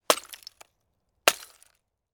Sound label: breaking ice; nature